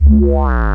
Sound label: sweep me low bass